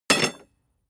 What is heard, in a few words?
metal tools drop; berufe; handwerker; soundpool